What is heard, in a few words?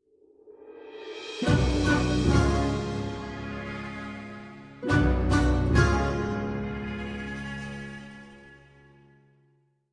intro Splash; steamtower